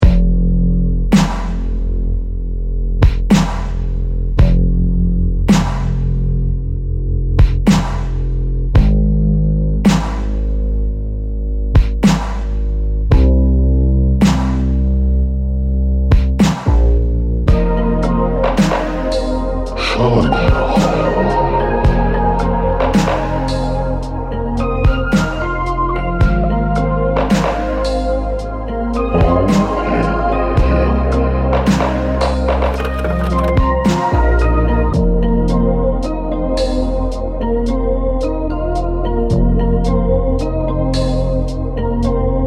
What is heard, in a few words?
japanese train, lofi